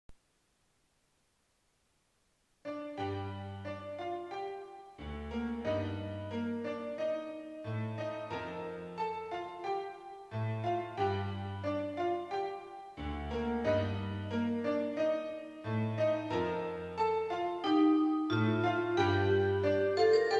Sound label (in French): danse pluie